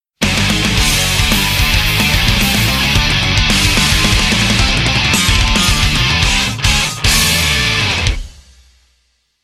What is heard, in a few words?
On Death; jokingyou